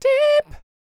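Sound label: falsetto, R&B Dance; Spectrasonics Vocal Planet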